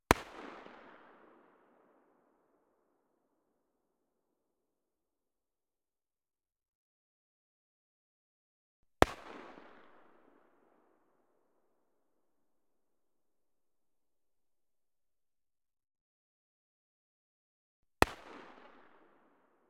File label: Lynx 50 - FIRING - 65m Behind, Sniper Rifle; Pole Position; Lynx 50 Cal Sniper Rifle; ORTF; CM3